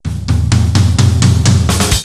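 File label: sting, drum intro; transformers war for cybertron gamerip; IACON INVASION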